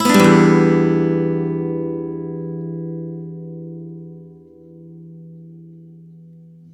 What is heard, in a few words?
One Shot E min7 upstroke, Acoustic Guitar; One Shot Chords; Sonic Foundry (Sony Creative Software); Songwriter Acoustic Guitar Companion